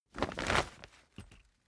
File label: camping chair pack up hard